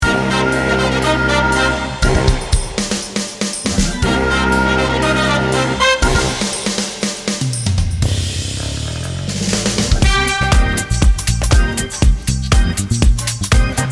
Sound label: Funkay